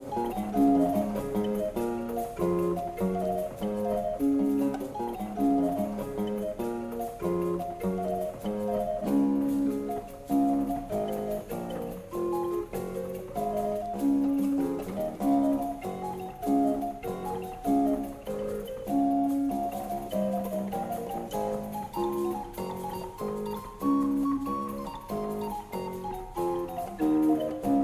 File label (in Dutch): barokgitaar; Spain